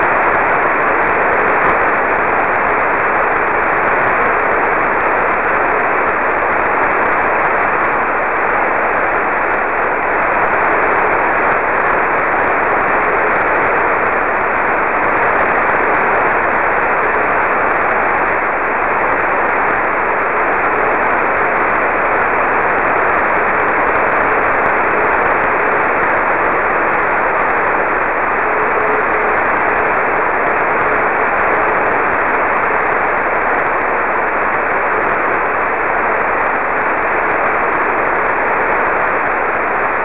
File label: CW 2400Hz bandwidth